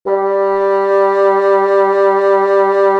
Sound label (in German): 800Hz Fagott